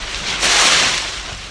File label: Tree Fall Branch Mvm; trees